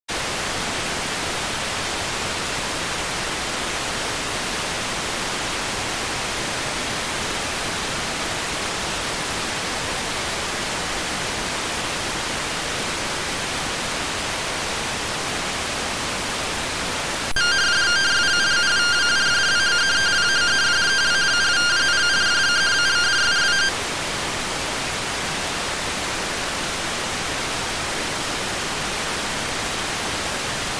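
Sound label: radioteletype receive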